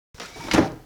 wooden Crate Close

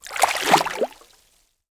water footstep

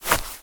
grass footstep

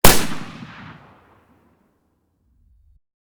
svt40 shoot, weapon

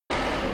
kikimora Hit

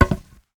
metal footstep

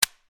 dp28 no ammo, weapon

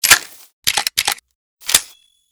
toz load, weapon; metrocraft

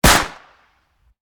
pmm shoot, weapon

